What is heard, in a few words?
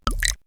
Wet Glunk, perc fx; X-Static Goldmine